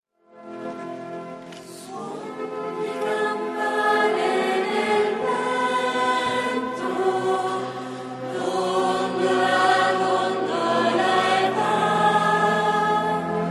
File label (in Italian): Dolci Campane A Natale